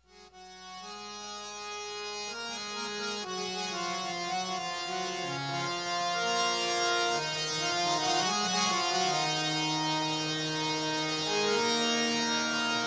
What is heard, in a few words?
Bhajans Hare Krishna Kirtan; Bhakti Sangam Festival 2012; Ukraine